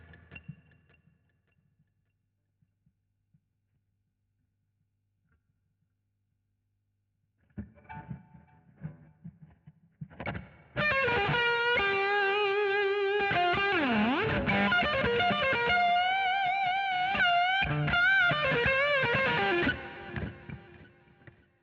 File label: GTR B Solo